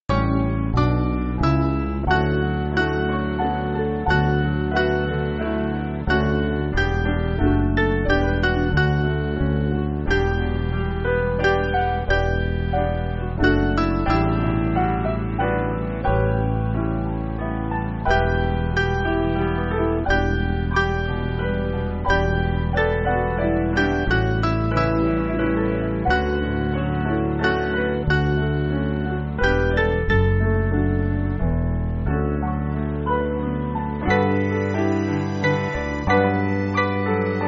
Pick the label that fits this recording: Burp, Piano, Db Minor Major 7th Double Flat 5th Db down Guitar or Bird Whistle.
Piano